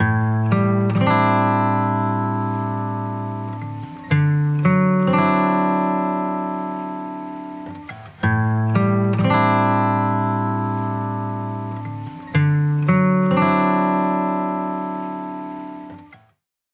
Guitar VSC 3 2:1 Ratio 3ms Attack Release 3 dB GR Peak SC Off